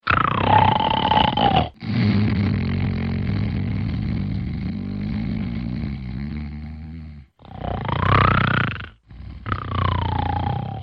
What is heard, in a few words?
Ring Heavy